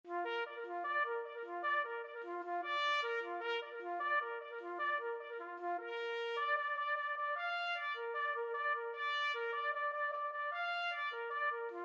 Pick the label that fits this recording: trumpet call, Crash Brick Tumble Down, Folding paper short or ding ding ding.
trumpet call